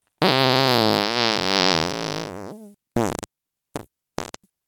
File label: fart; vegan